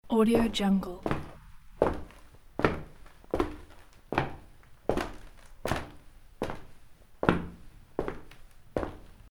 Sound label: walking indoor on wooden floor loop